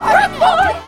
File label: Catfight